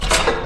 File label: lever